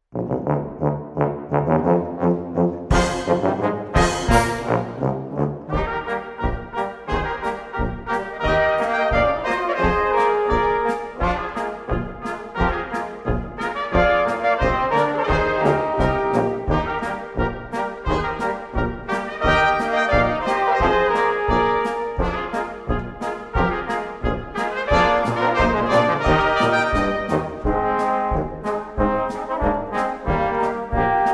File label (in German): Polka